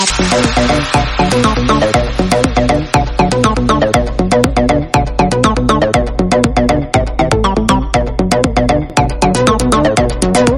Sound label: Groove